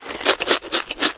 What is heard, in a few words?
stop; Geschicklichkeit; AquaBubble2